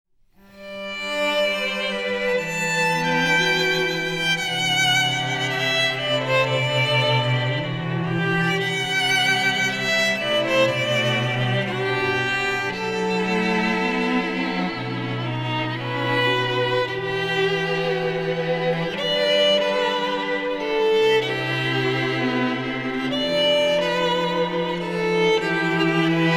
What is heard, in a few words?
string quartet excerpt